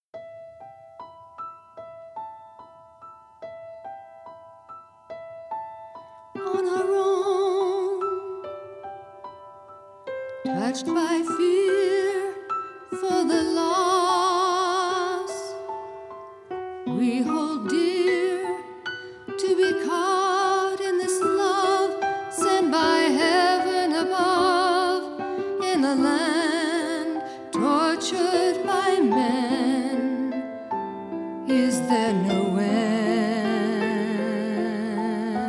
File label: Vocal